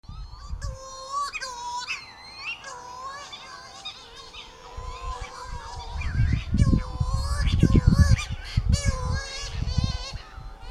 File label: adult male shearwater vocals